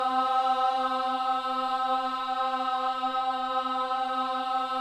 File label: BOYS AHS, Symphony of Voices